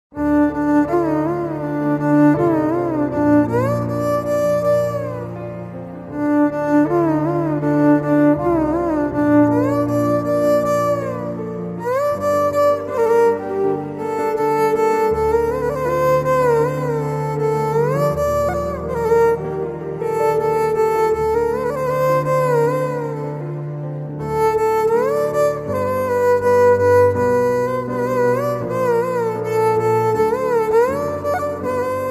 song bgm ringtone, south indian bgm ringtone, tamil bgm ringtone